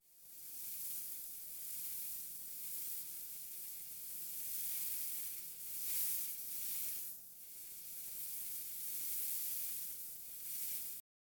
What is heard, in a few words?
Pouring Sand Out